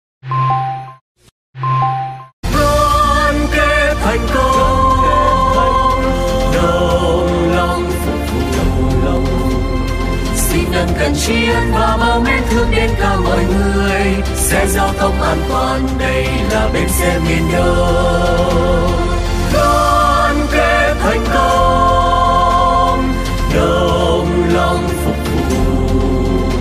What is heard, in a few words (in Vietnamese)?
BAI HAT DOANH NGHIEP; BAI CA BEN XE MIEN DONG